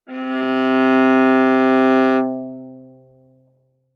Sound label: Viola arco ff sul C C3